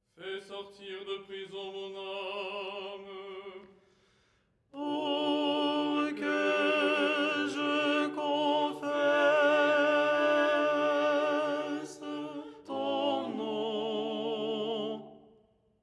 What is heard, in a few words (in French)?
tenor; Verset : "fais sortir de prison mon âme..."; Les 8 tons en français